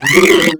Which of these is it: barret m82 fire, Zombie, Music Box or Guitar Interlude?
Zombie